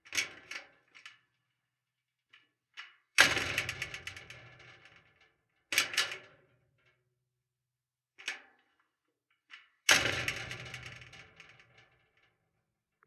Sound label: Metal Gate Open Close